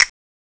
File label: CSTNET; CMI